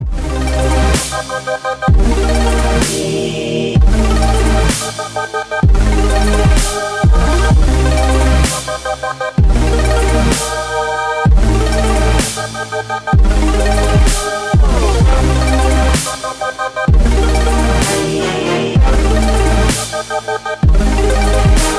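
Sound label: ringtone iphone XR presentation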